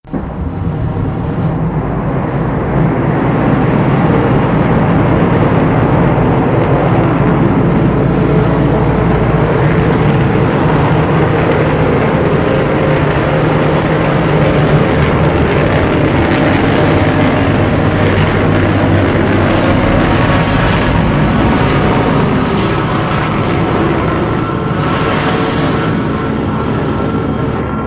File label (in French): Son sans alarme